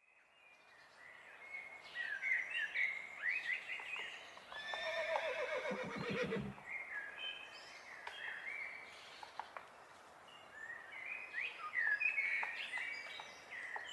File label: Forest; Age of Empires III